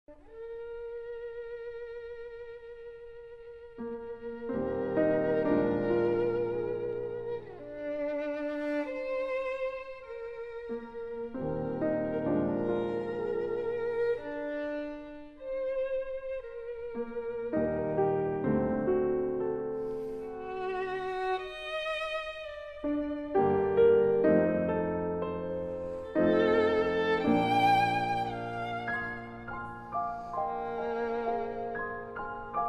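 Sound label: violin sonata; saint-saens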